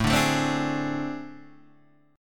A Minor Major 7th Double Flat 5th A, Guitar, DADGAD